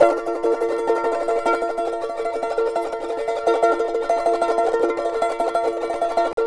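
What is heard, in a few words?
CHARANGO, B major, tremolo; Spectrasonics; Hans Zimmer Guitars VOL-2; CHARANGO CHD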